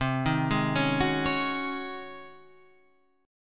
G sharp 11th C

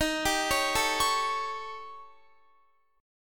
Eb 7th Flat 5th, Eb, Guitar; arpeggiated

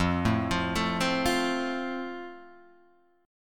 F Major, Guitar Drop D

